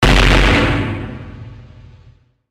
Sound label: explosion; maoudamashii